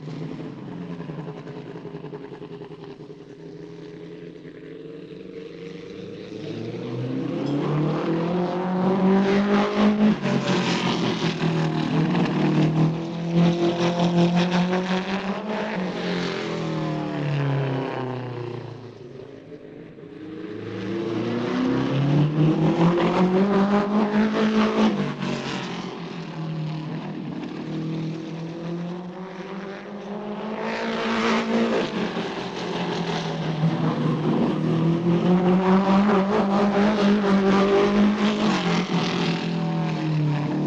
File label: airplane, Vehicles